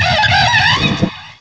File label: cry; source of the sovereign